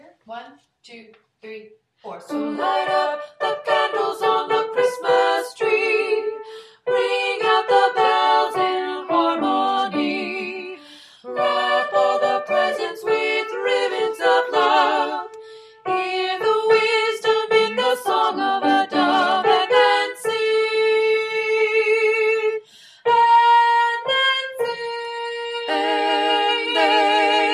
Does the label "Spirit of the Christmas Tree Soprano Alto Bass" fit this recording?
Yes